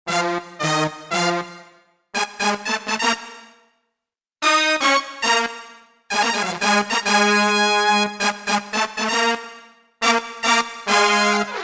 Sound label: Stab Brass, Roland JV-880; Factory Patches